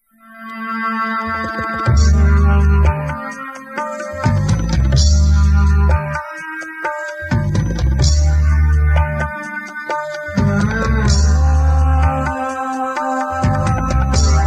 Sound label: Pushto Theme